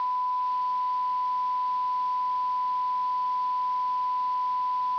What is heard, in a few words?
tone